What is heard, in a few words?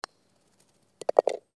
Golf Putt in Cup; iMovie Sound Effects